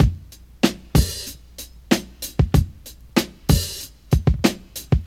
95 bpm modern breakbeat b key

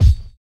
smooth bottom end steel kick drum sample f key

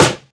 short acoustic snare f key; snare drum samples

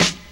smooth rap steel snare drum sample e key

